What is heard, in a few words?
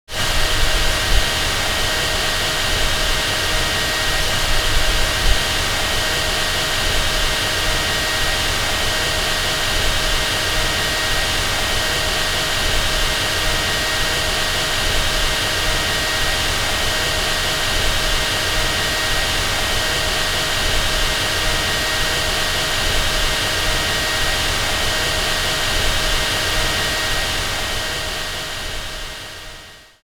Calming Hair Dryer